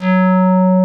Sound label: BASSVOX; Masterbits; Vintage Voltage; SQ+FX